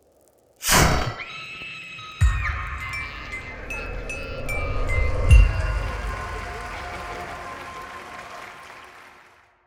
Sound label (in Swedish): jingel